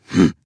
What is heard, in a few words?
Assassin Grunt Fast; Anarchist; Realm Royale